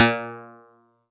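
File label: Old Banjo DX100 A sharp 5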